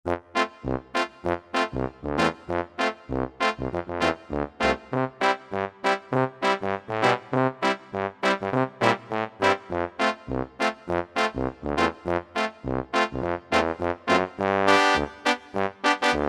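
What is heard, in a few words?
Trombone Trumpets, synth; TromboneTrumets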